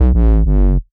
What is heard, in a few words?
C Bass, DnB One Shots